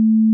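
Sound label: spin, Slot; Huff-Style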